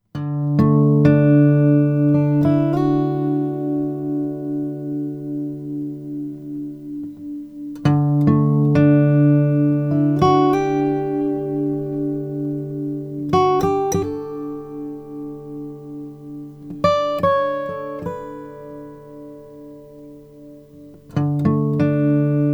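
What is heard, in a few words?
taylor 710 brazilian rosewood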